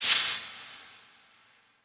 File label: Transition Metallic Blast; Production Element